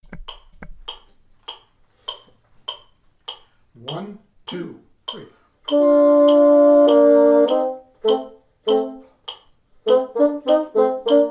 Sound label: Clarinets 100; Upper voices